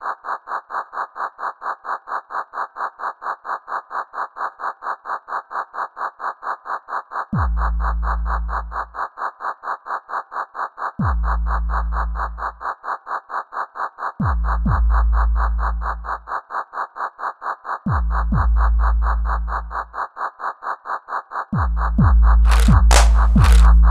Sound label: beat